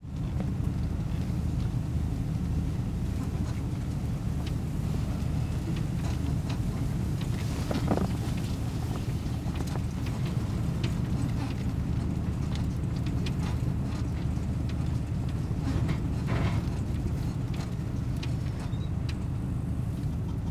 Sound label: Tesla Model S Interior